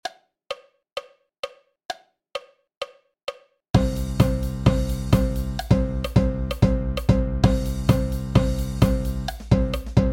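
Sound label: 130 BPM with countoff, Marching Percussion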